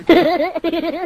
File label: goofy laugh meme